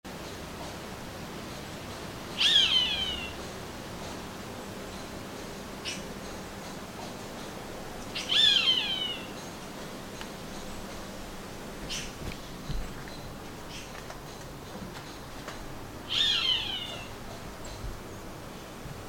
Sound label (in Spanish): Cantos Gritos de Nuestro Sagrado Hermanito Halcon